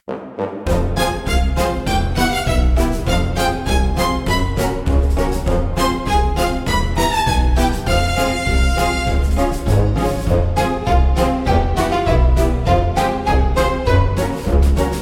Tuba Tumble, comical orchestra for slapstick gag scene